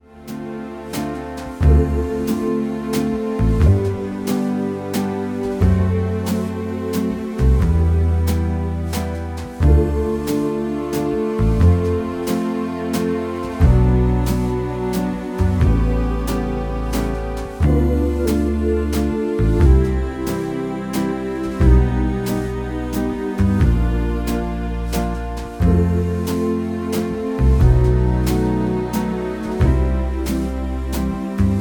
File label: Yamaha Genos Style Jam